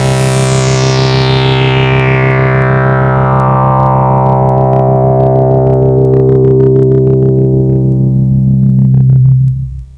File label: fifths, Synths; Notes